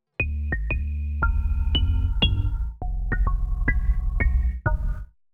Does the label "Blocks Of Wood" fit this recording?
Yes